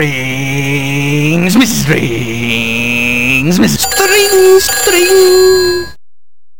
String String

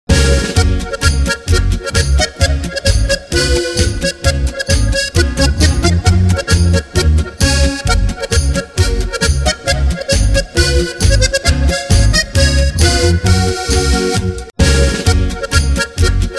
Polka

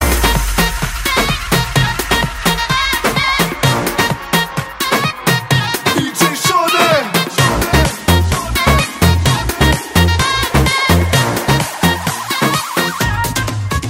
beat

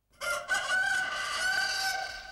crowing rooster sms

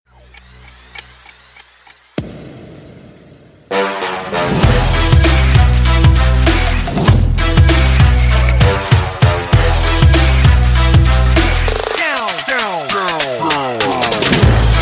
Inst

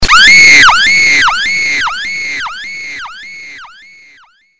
Scream

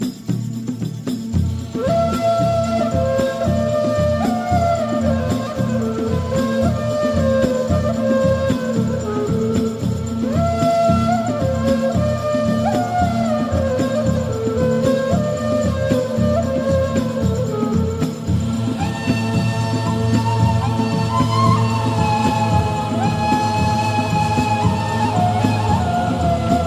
Ney

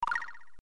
Animal Token; DKC

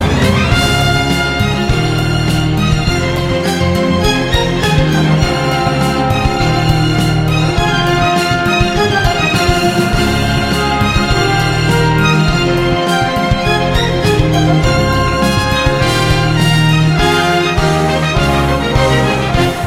Dynamic Violins

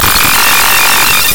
Nokia Remix

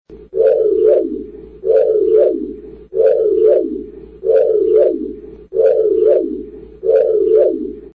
KITT Scanner

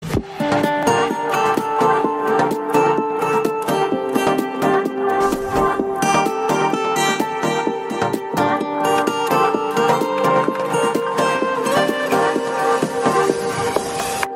Romantic

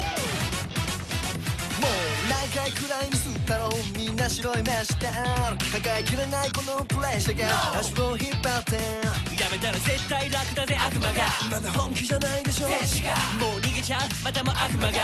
Funky

